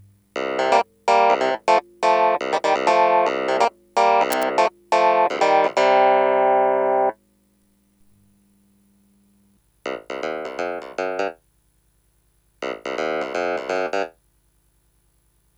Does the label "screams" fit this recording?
No